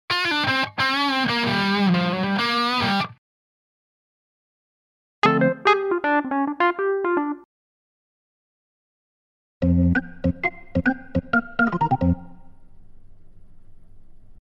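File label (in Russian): электрогитара бойкая конец короткая музыка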